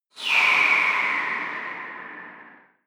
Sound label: air breath release short high